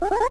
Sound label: sword spin attack load; zsdx